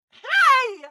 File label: car reaction; Blind Drive